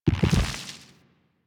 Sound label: Nunchuck Pulse, Weapons; Warframe; Infested